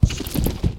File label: Swarm Inside Wall; silent hill homecoming; ps3